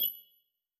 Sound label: back style 5; inteface sfx pack 1; Back tones